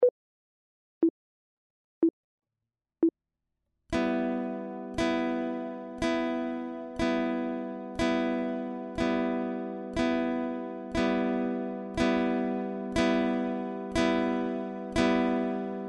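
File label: chord, guitar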